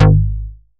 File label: bass classic F; M8; Polyend; ARC Noise